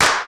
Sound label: PRC GROUP, Clap; Roland L-CD701; Clap & Snap; Clap Modules